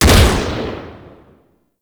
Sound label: Tiberon Prime Shot C, Rifle; Warframe; Tenno; Tenno Drake Rifle